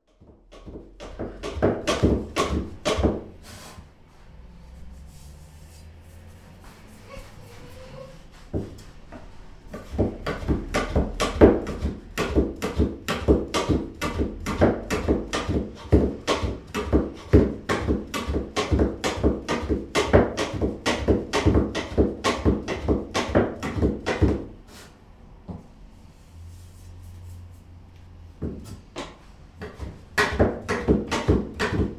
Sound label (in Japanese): そば切り